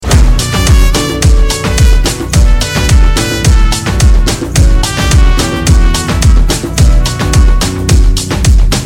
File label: Sexophone Mix